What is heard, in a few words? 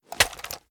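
assault rifle reload empty fast extended magazine end; weapons; gmod; viper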